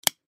High Chair Snap, High Chair Snap Buckle; Household; Miscellaneous Household

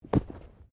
Bodymovement Fall Light

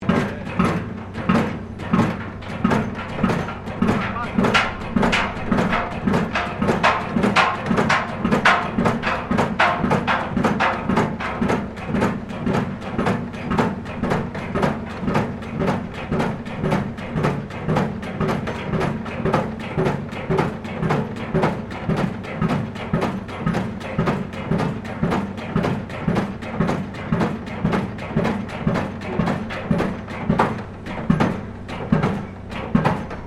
Construction Pile Driver Operating Close Stereo; Construction site pile driver operating

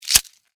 Scorpion Tail Sting, Stinger, Attack; Animal, Scorpion; Arachnid, Insect, Bug; BlastWave

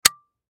Packaging Tape; Tape Dispenser Load Tape; Offices; Blastwave

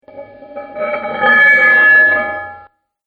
Metal Manhole Cover

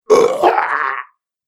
Alien Monster Dying Sound; Alien Sound Effects

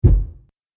Body Slam Impact, wrestling and struggling; Wrestling And Struggling Sound Effects

